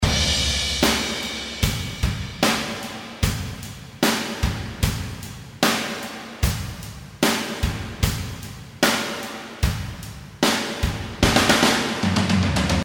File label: Drum